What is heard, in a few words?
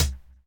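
hi-hat percussion, Dance Mega Drums; Best Service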